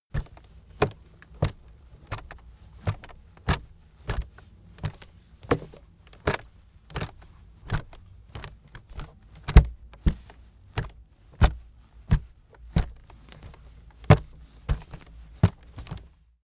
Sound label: aluminium case walk